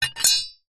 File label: knife set down concrete